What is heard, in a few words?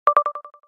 ios18 app clip prompt; Системные звуки и уведомления iOS 18 (iPhone 16, 16pro, iPad)